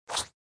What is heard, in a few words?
Chomp Sound; sushi cat